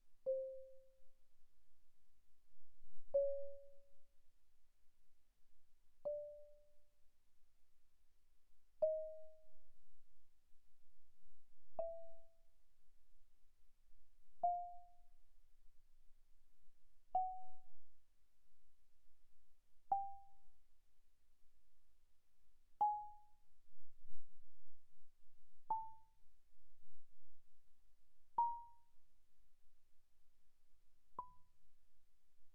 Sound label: Marimba rubber pp C5 B5; Percussion